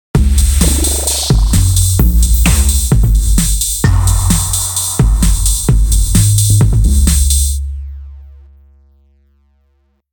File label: drum without fx